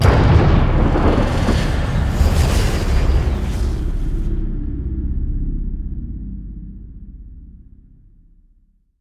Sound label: end portal